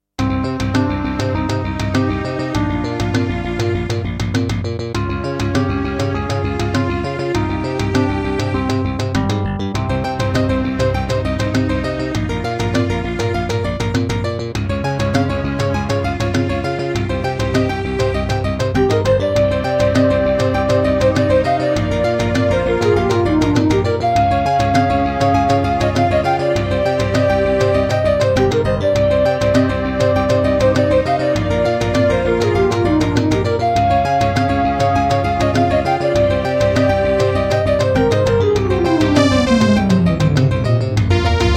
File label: Terratec WaveSystem SWC001-2; Introduction; Hocus Pocus